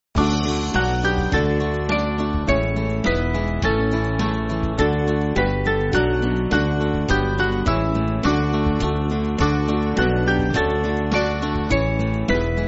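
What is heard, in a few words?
Band, Backing Files for Churches; Psalm 138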